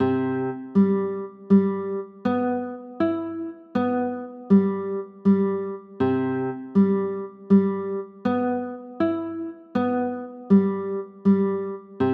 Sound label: c maj7